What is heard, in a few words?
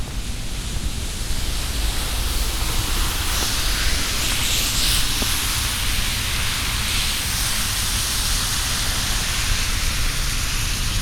Pedestrian Traffic, Wet Road, binaural